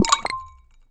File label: Cure pop